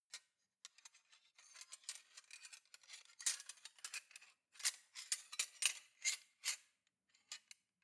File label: cutlery large multiple rattle long